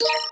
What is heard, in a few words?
Video Record, ui; RED Hydrogen One